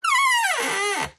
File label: Wood Stress Creak Squeaks; DPA4060; pmsfx